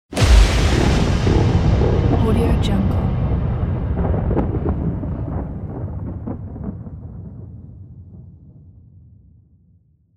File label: artificial thunder